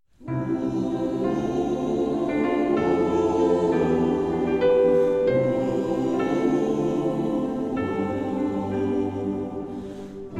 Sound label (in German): Chor